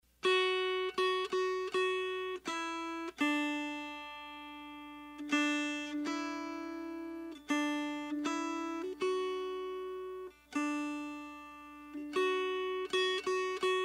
Un Kilometre a Pied Melody

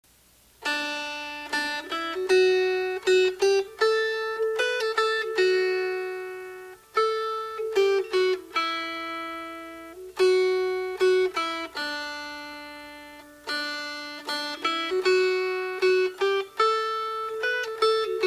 Melody; Long, Long Ago

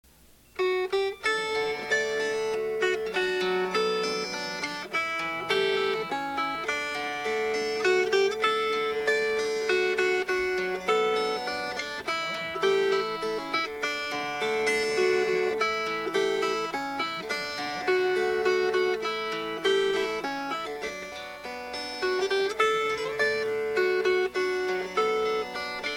Fingerpicked

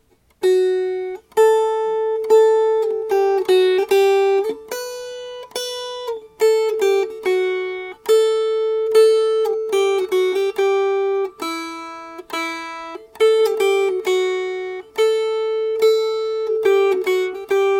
Melody

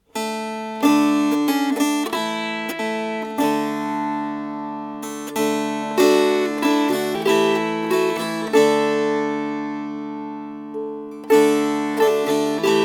Strummed; My Dame Had A Lame Tame Crane

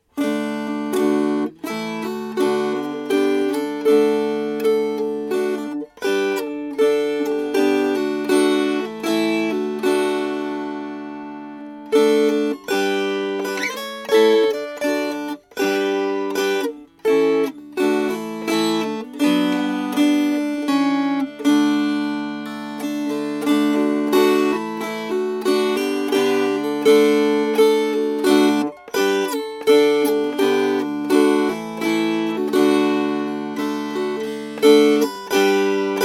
Strummed

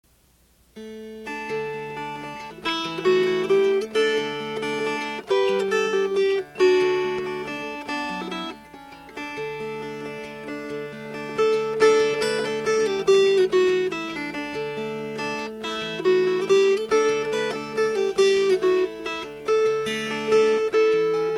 Fingerpicked; Mary Hamilton

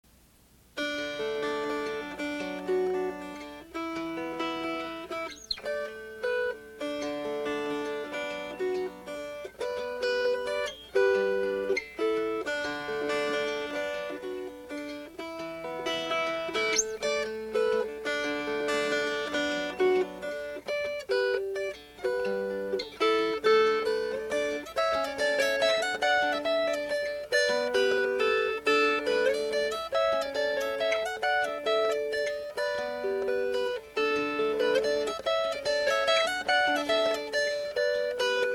Fingerpicked; Ha Na Boddachin

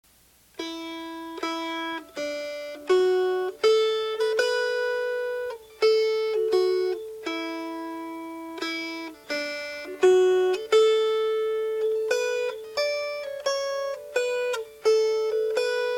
Melody

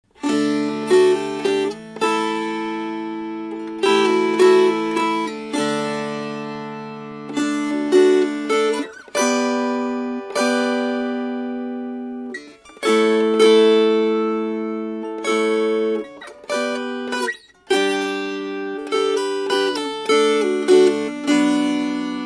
Strummed

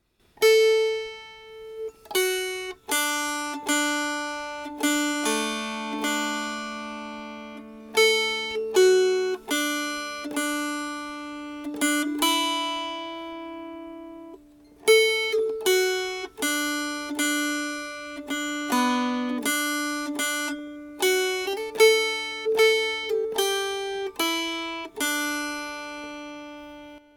Melody; My Lulu

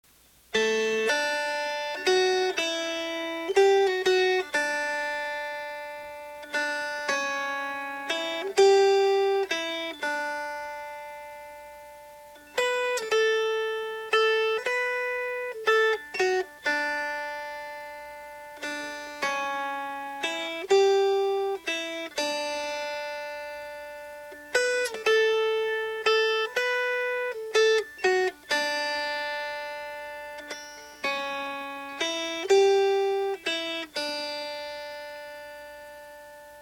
Melody

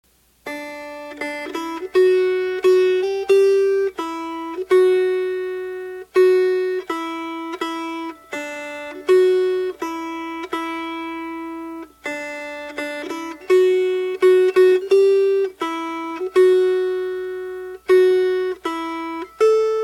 Melody; Donkey Riding

